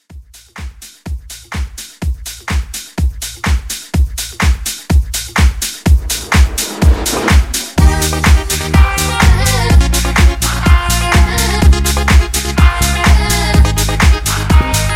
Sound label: 80s House Re Drum 125